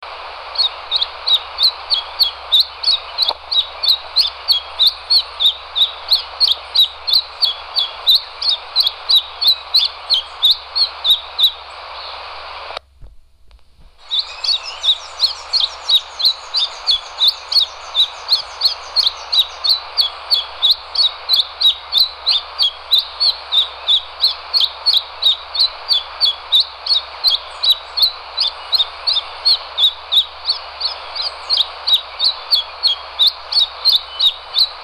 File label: mountain robin